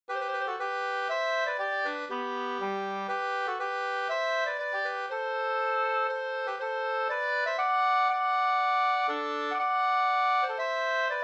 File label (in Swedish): polska Bodapolska